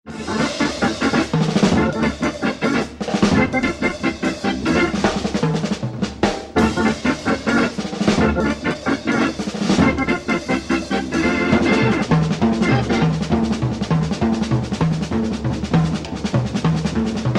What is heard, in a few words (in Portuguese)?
Bateria